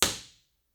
IR

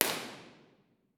Dodec IR, Teatro Municipale Piacenza; Dodec IR B&K2250

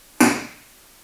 IR

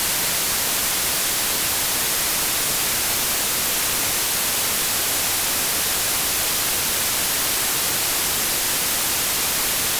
White Noise

